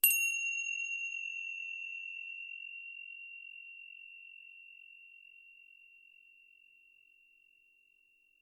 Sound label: tibcymb high sound